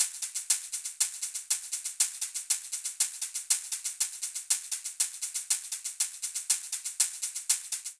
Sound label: Candombe Shaker 120bpm, Latin Percussion; Ableton; Factory Packs